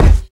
physics cube sm; Portal 2

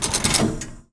apc lever; buttons; Counter Strike; CS:GO

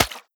wet, player footsteps

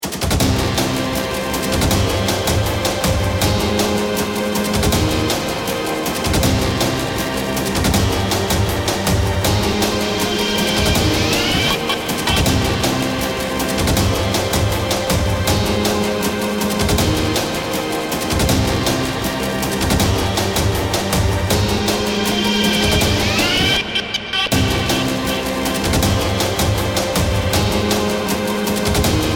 bomb planted

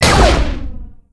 fire, weapons; Portal 2; wheatley

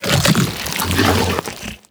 bite digest, barnacle creatures; Half Life Alyx

